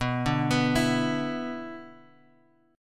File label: B-Minor Double Flat 5th B down, Bass Standard; Bmbb5 arpeggiated; JGuitar